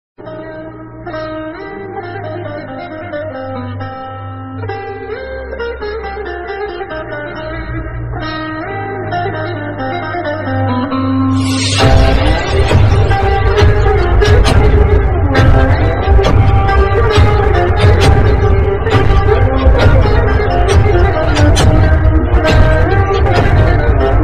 crazy ringtone bgm background music attitude ringtones